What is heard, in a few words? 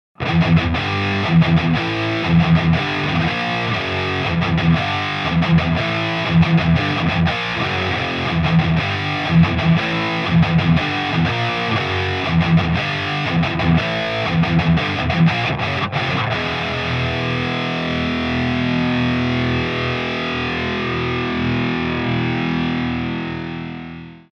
vh4 ch4 compression mod; channel 4